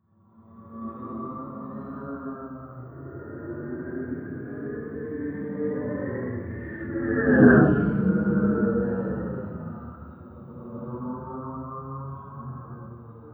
ALIENS, Anime Sound FX, FLYOBJECTS

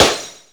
glass, Yamaha RX-5

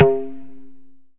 LOWTA, Tabla; Mango Loops

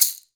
Maraca, Perc; Akai MPC500; LA Perc

